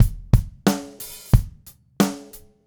Red Maple Groove 20-inch Kick 90; 2002 DW Red Maple Kit; 90 BPM; Sonic Foundry (Sony Creative Software); Vital Drums

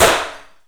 STUNN BOMB; 3D Sound - 3D Keys VOL-1; MEAN 3D

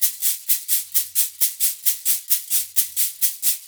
SHAK, Percussion Loops; USB Soundscan vol.36; AKAI

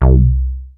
DEEP HOUSE, mono poly; TECHNO-BWARE

